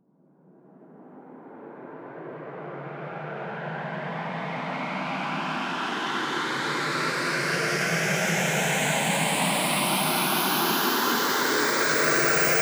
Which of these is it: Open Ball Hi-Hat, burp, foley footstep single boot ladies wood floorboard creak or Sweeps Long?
Sweeps Long